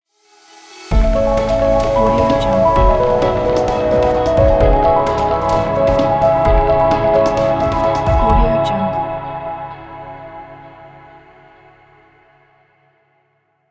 ambient logo

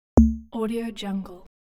unique electronic log drum sfx

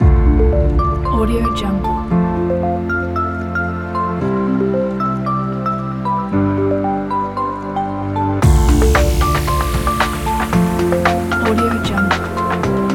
technology positive

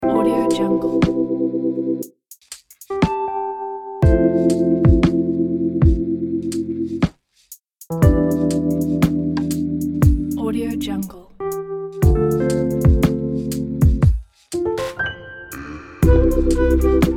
jazz night club

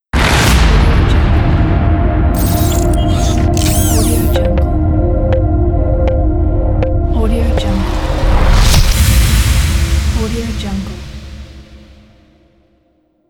powerful logo

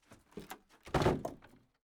crate of rocks; prosoundfx

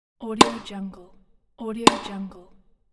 tennis ball

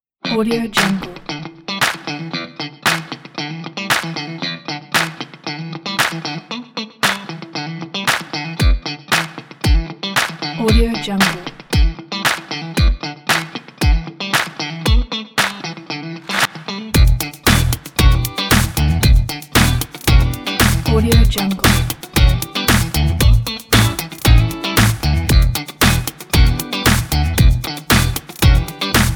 start funk

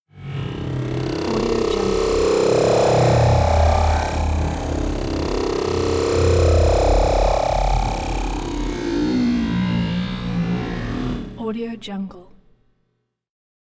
robot sensor pack

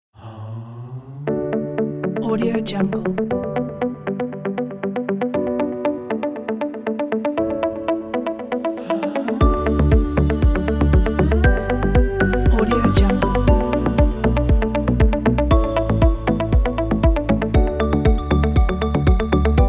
victory corporate

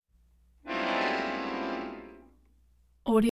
accordion; prosoundfx

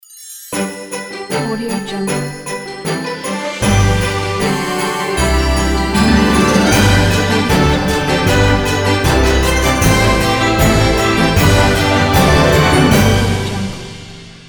christmas logo

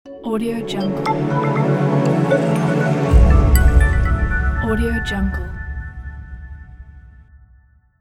stylish tech logo

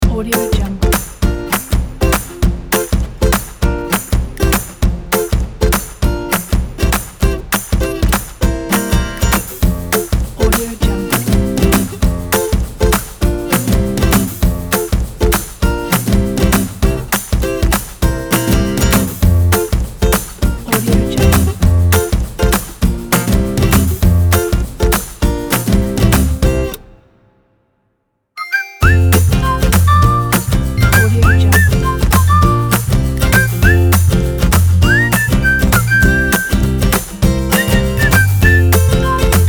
happy

